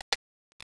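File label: Retina IIIS, camera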